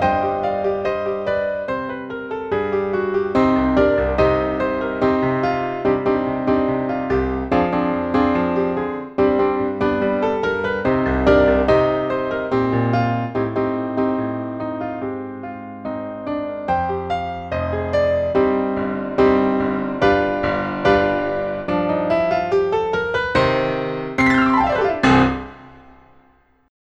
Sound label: accomp; battle of jericho